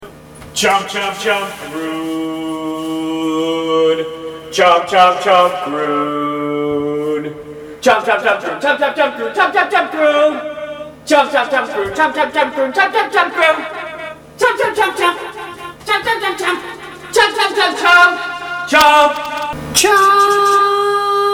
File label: chomp chomp chomp